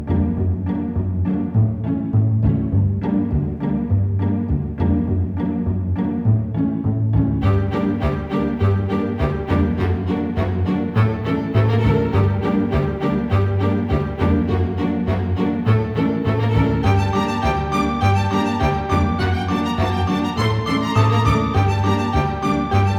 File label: playful Strings; Castle on the Coast; PS4